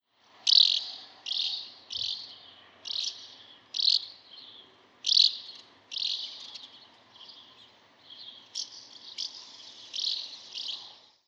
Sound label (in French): hirondelle de fenêtre cri